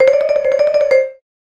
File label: bellboy ringtone